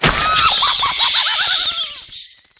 pumpkin bats laughter; Angry Birds Seasons

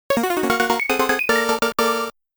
Extend Fanfare; Motos

